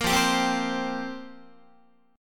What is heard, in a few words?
F Suspended 2nd Suspended 4th F down Guitar; strummed; 13,13,10,10,11,11; JGuitar